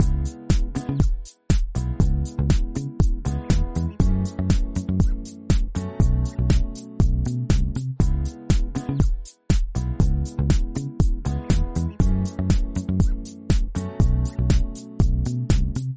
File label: menu music